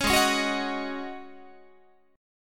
G sharp 7th Suspended 4th G sharp, Guitar; G#7sus4; strummed

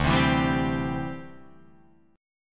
D 9th Suspended 4th D down Guitar Drop D

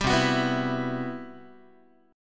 C Major Sharp 11th, C, down, Guitar; CM#11; strummed; 10,8,9,9,8,x; 4A,4E,5A,5D,5G#,6C#

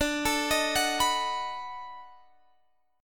B 7th D; B7/D; arpeggiated

